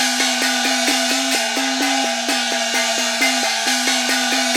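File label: percussion 130 BPM, left; INIS - Opium; PERCS 130BPM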